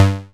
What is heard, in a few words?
Synth Cuts; Vengeance Samples; VES1 Instruments